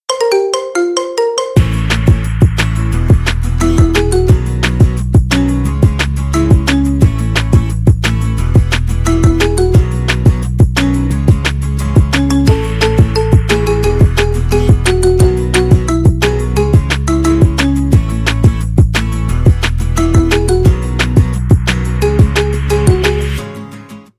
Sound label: marimba remix